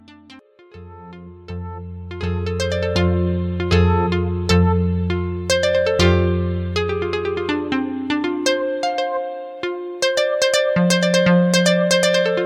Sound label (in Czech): Vodní harfa na jazzové procházce